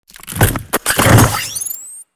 Gear Shift Power Move; 换挡引擎

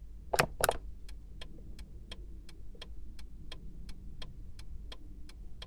turn signal switch; 转向灯

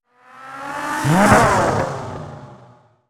Fast Corner Gear Down; 快速过弯

